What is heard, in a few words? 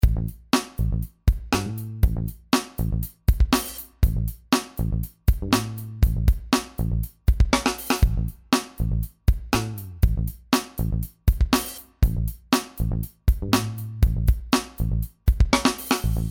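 more compression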